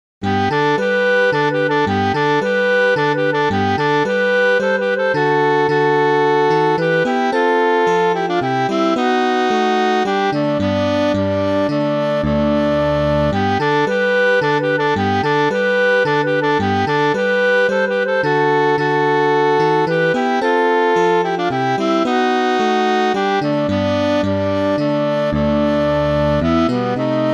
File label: Fanfare; scummvm; ultima4; Fanfare Of Lord British